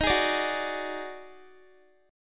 Eb Minor Major 7th Double Flat 5th, down, Guitar; strummed; 4F,4A,5C,5G,5C,6E

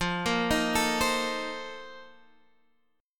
Bb Major 7th Suspended 2nd Suspended 4th

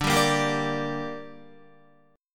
D Suspended 4th Sharp 5th D down Guitar, chord; strummed

